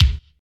silky steel kick drum sound b key, bass drum single shot; kick drum samples